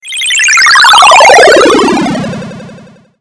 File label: Spaceship Landing Sounds Car Horn